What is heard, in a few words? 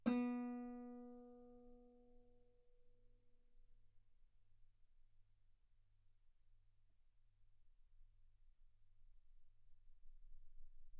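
Guitar ff B string B3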